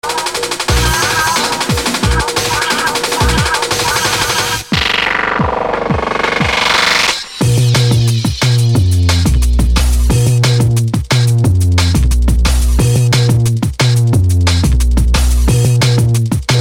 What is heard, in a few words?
Drum And Bass